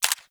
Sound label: SMG Mech; Weapons; Warframe; Tenno; Sundial